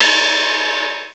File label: RIDE CYMBAL; CMI Sound Library Rev 1.3; CYMBALS